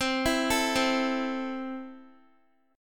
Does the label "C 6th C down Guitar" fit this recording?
Yes